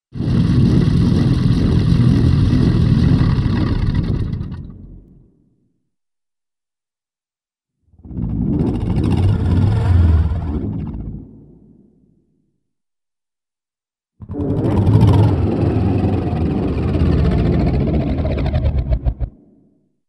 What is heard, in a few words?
Low-pitched Machine Growl That Descends To Stop, cartoon; Hollywood Edge; Cartoon Trax